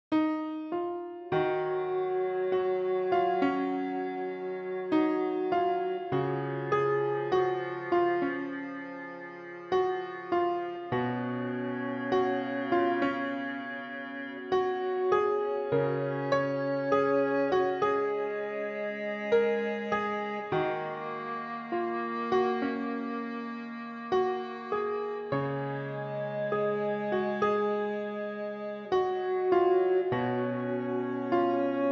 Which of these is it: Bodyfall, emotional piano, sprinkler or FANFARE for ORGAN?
emotional piano